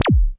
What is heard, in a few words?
laser spit, Electronic Hits